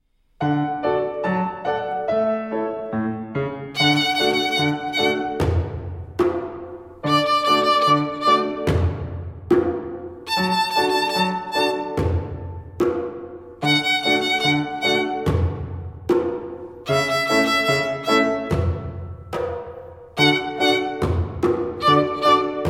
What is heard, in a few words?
The Grasshopper Exercise